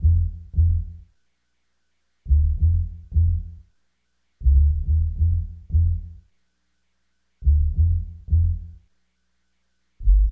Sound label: Hip Hop and R&B